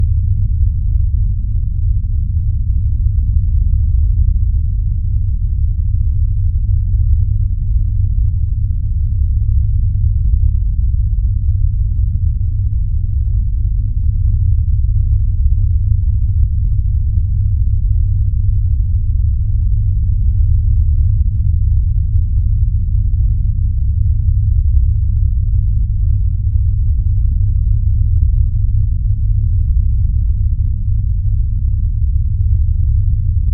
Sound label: scifi spaceship deck nois; bip